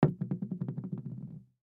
Mousse Can Set Down, Mousse Can Set Down Wood Surface Wobble; Hair Salon Barber Household; Primping; Foley; SoundEffects-Blastwave